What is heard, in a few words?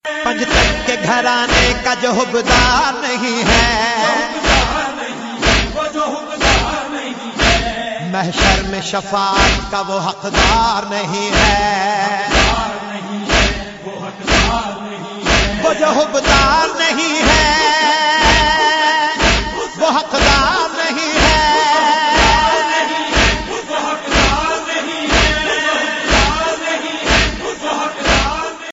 noha ringtone